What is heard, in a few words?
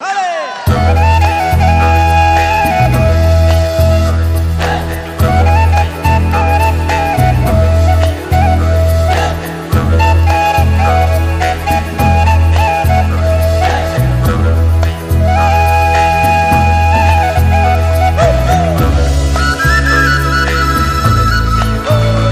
Lowland Acoustic